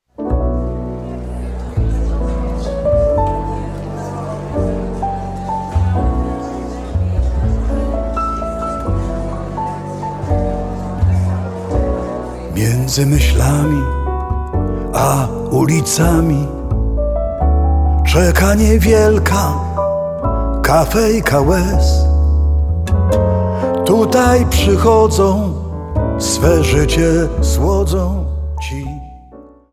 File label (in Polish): smutny jazz